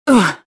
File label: land